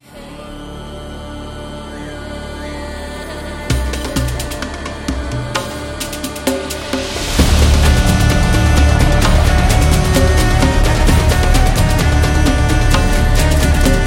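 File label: Arabia, epic cinematic trailer music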